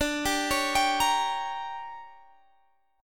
D Major 7th Suspended 4th Sharp 5th Guitar Drop D, chord